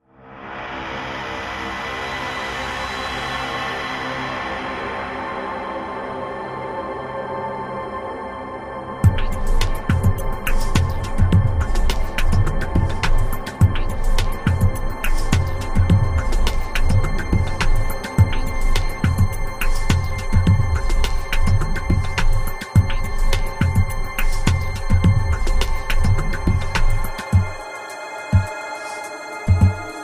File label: music upcoming action light; Ioncross Total War